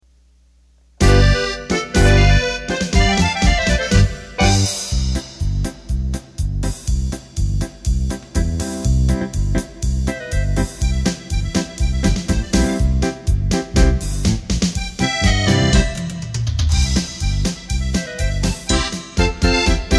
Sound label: Polka, accordion